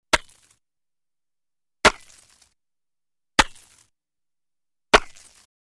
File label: Chop Ice On Lake Shore, Axe Or Pick Into Solid Material, Some Ceramic Tone 4x